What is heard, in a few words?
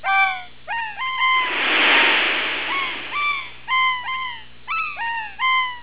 gulls